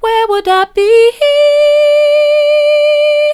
where would i be soft, vocal

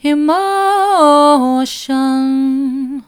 emotion soft, vocal

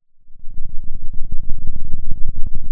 Sound of a thunderstorm, center

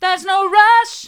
theres no rush hard, vocal

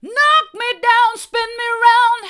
knock me down spin me round hard, vocal

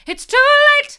it's too late hard, vocal

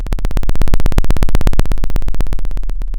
Sound of a plane taking off

